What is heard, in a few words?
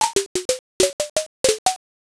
blocks collapse